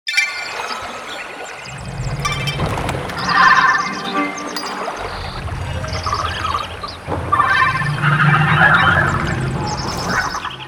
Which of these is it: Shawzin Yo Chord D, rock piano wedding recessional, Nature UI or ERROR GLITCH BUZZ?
Nature UI